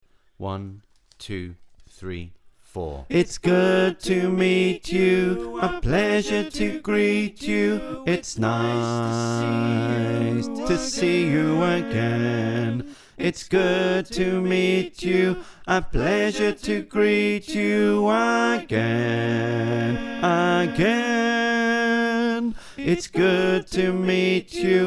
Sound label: Bass, choir